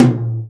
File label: tom, Real Drum Kit, jungle kit